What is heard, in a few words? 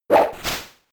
Vine Whip